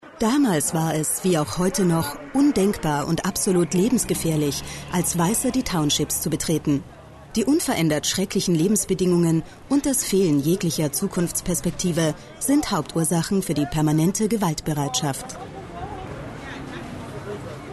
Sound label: singing